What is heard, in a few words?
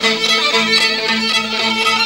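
VIOLIN GROOVE; Best Service; World Colours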